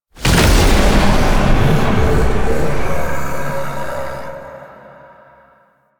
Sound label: Full Health Stinger; Enemies; Warframe; Entrati Doppelganger